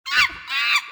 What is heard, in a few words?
monkey; zoochosis; lazynap; dolphin deepsea monster